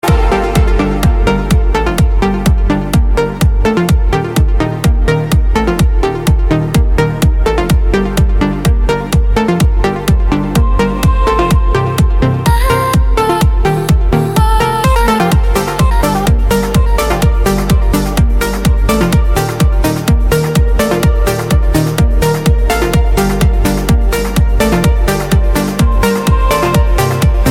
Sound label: EDM Music